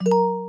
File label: chat message